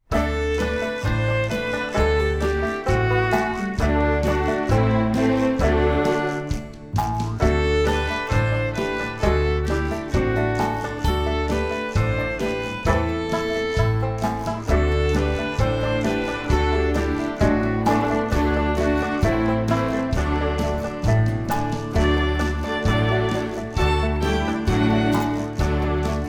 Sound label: Old Joe Clark Acc